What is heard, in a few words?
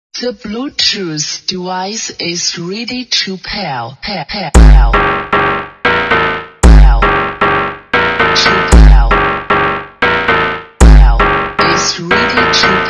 Bluetooth Funk slowed